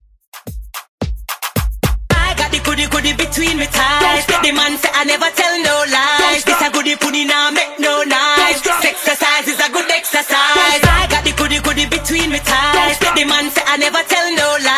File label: Re Drum Dancehall 110 Bpm